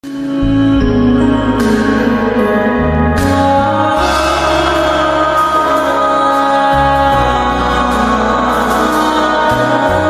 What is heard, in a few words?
new ringtone very sad ringtone attitude ringtone very sad ringtone